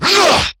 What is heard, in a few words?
Melee Attack; New War; Erra